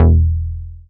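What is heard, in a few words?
E1 ANA BS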